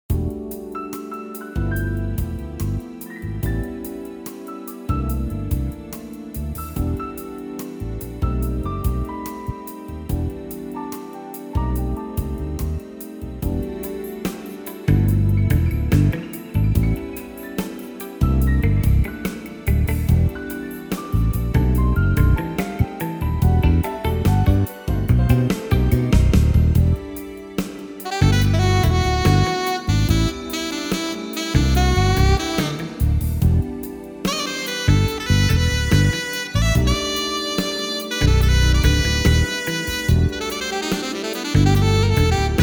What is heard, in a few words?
smooth jazz